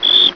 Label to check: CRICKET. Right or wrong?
Right